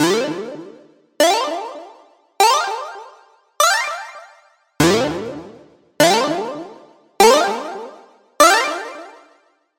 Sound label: SFX - Bit Jump; Routed Sound; Spire Expansion; Hyper Gamer Vol.1